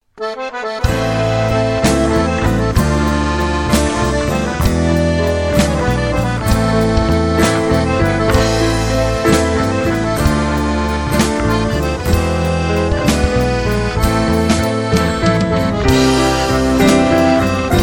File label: Jingle BAND Musette